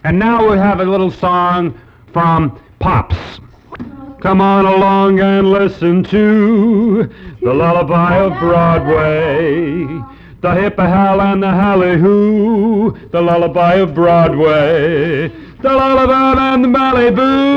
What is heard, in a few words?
Pops Singing